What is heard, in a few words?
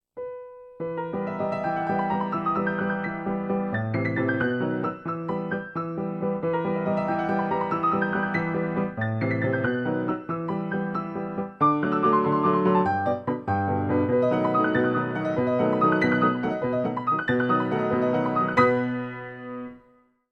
Vintage Grand Untuned Etude